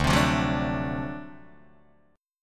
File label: D 7th Sharp 9th D down Guitar Open D